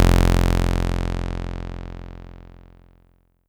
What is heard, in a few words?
BASS SH09 F0, Synths, Roland SH-09, Bass